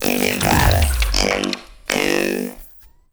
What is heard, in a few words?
lithoid portrait machine; Stellaris; lithoids; portraits